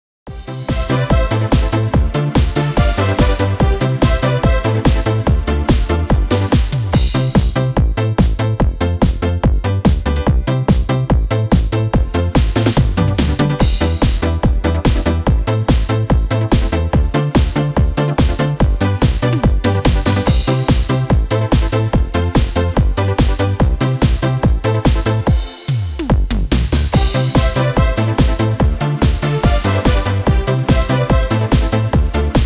Classic Disco

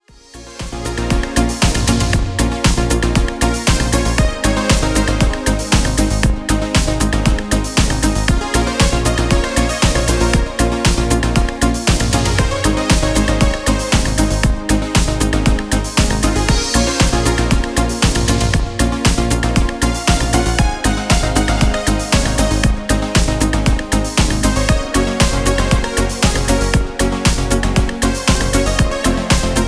Fancy Disco

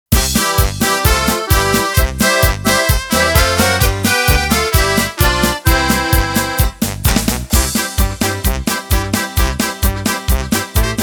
Oberkrainer Polka

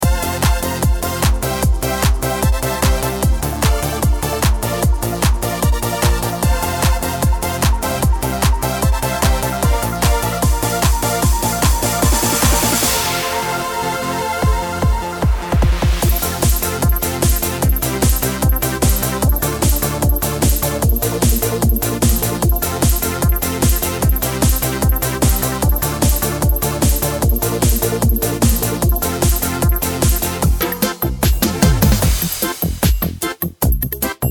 Trance

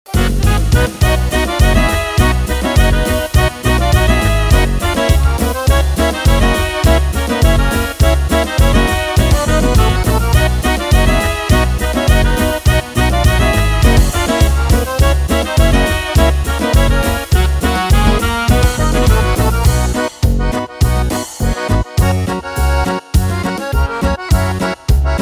Folk Party

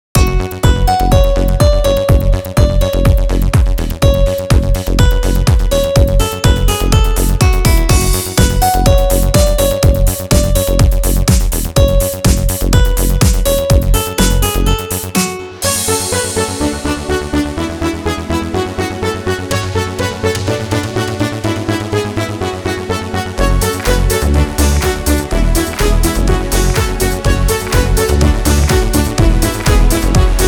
Snap Hit

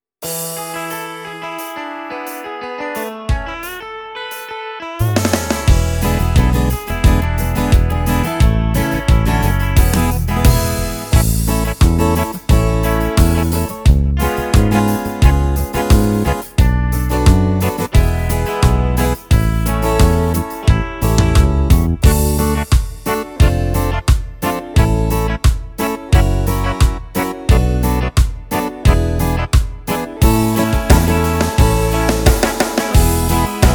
Beat

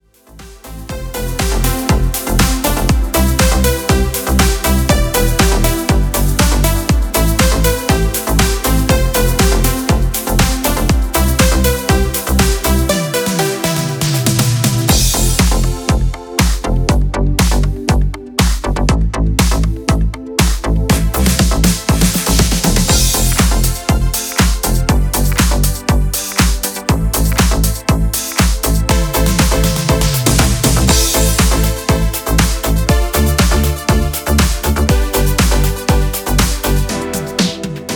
Dance

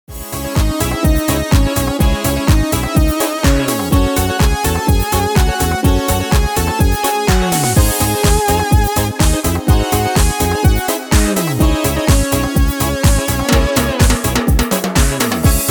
Disco

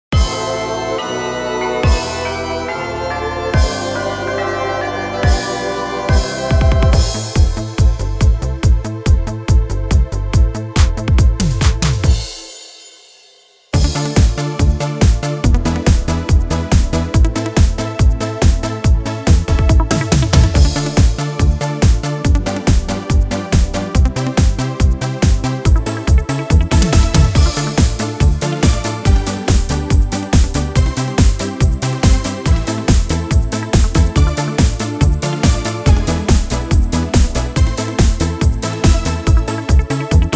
Disco

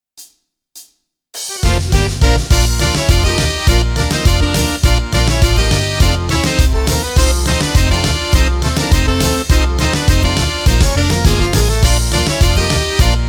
Folk Party

